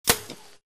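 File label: bullet light ballistic titan ricochet; Titanfall 2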